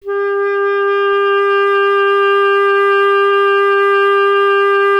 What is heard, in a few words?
Clarinet G; Miroslav Vitous; Solo Instruments VOL-1